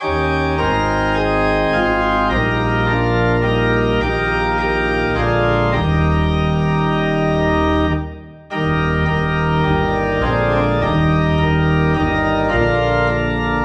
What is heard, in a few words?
Organ Low in E Flat; To God be the Glory